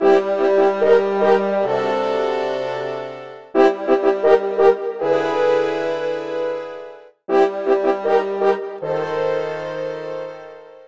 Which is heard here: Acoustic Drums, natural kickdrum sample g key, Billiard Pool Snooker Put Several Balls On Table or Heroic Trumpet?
Heroic Trumpet